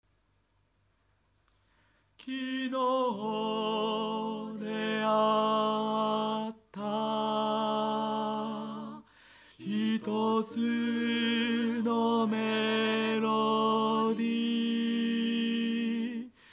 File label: tenor part